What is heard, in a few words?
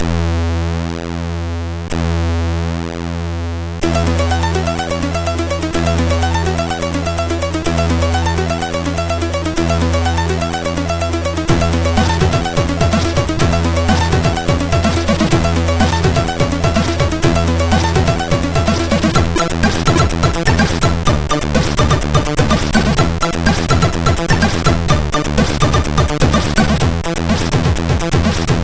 Lame Old Tune, MOS6581R4